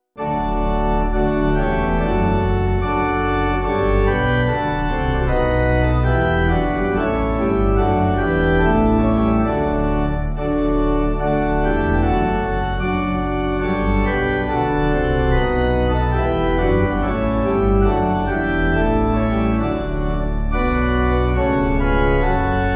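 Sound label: Pipe Organ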